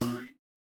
cartoon boing

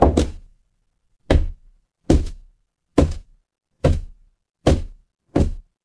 footsteps stairs

